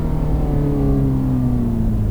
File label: throttle off low speed, vehicles, ferrari 488 gtb; autos v3